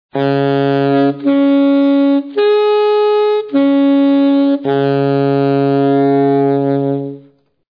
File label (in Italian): sovracuti, Sax